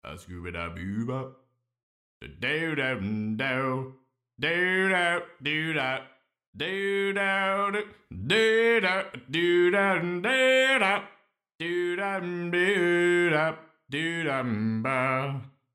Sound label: Barbershop; Soundiron Questionably Barbershop